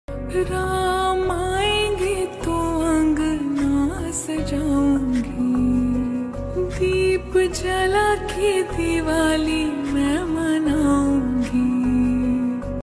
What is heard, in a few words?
bhakti; jay shri ram